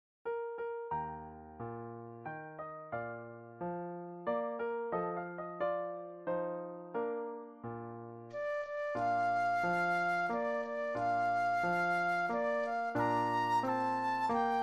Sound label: Lullaby Flute Solo; classical; Brahms; op. 49 no. 4